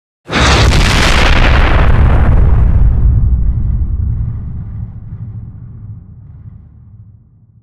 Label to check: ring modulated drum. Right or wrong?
Wrong